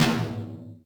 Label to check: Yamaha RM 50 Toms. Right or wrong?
Right